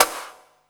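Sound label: snare drum -L, 168 BPM Drum & Bass; Ueberschall; Drum & Bass Resonance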